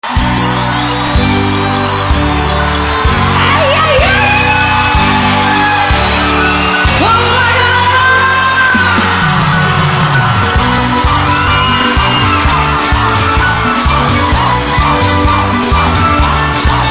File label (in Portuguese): banda ao vivo